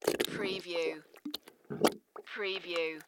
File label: Mud Bubbles